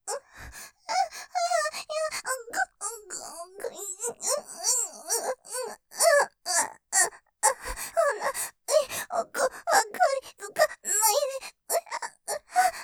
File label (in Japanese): 喘ぎ◆らめぇ。。。奥つかないで！！; ステレオ